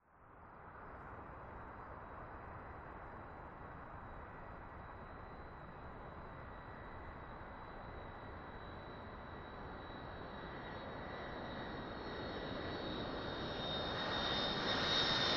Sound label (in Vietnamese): Tieng may bay phan luc ha canh